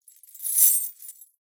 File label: Chains Drops; Just Chains; soundbits